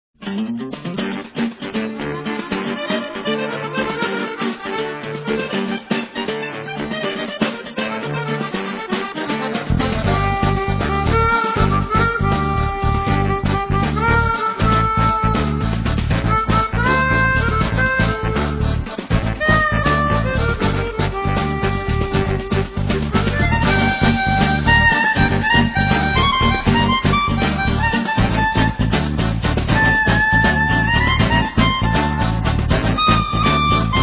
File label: Chromatic Sample, harmonica